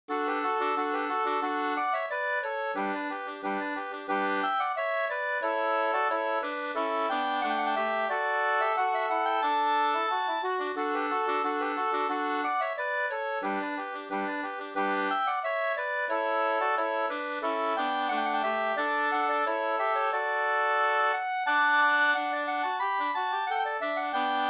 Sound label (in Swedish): ganglat